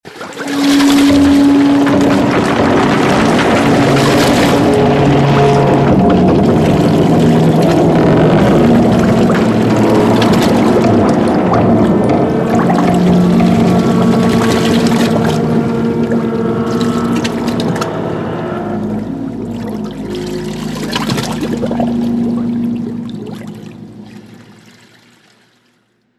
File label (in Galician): barco afundíndose